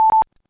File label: Wave Tone 880Hz 100ms quiet